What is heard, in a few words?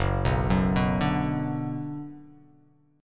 F sharp Suspended 4th Sharp 5th, guitar; arpeggiated; 2,2,4,4,2,x,x,x; down